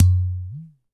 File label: UDU DRUM; AKAI S6000 CD-ROM - Volume 5